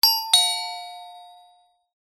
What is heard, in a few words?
doorchime